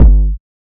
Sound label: hot kick sound g key; kick drum samples